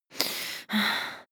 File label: breath female slightly aroused